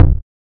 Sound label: Kick; Cruelty Squad